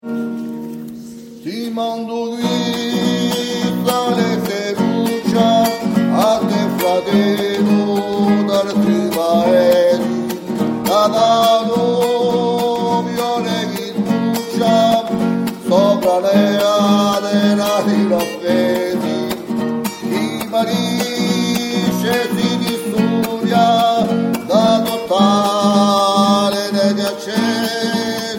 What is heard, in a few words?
Campesino basses; u fiuminale di u Ghjuvaghju